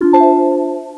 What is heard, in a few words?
win8 err